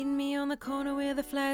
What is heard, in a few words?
Ld VOX SM7